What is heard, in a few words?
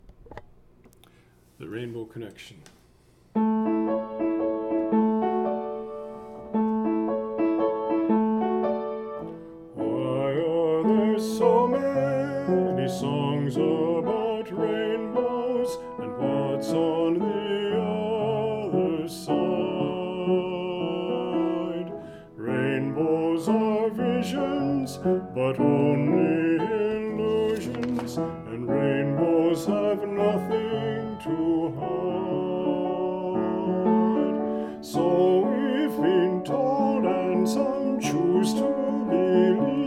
soprano